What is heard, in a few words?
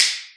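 clap, Boss DR-202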